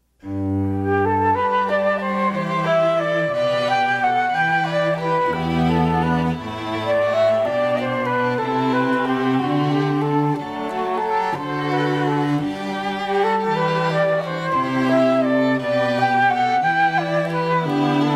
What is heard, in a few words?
Jesu Joy Of Man's Desiring, Classical String Quartet